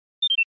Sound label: chirp end; musica lofi para estudiar